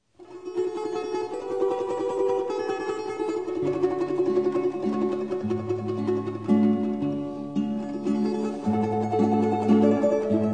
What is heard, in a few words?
Evening Bells